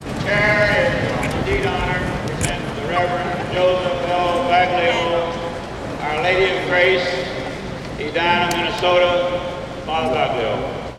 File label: Music unusual sounds; tony schwartz